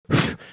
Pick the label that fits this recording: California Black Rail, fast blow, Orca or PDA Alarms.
fast blow